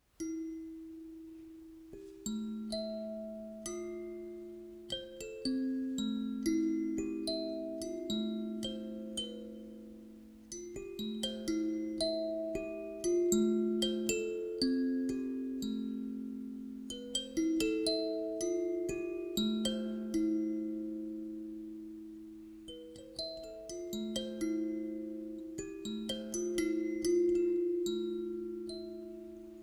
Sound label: Kalimba